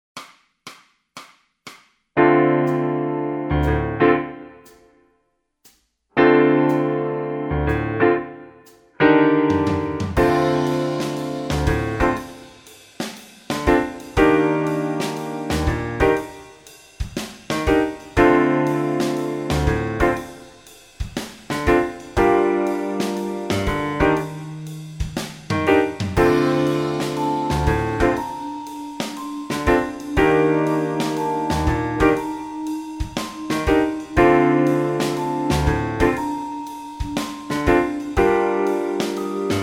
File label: bass modes, backing tracks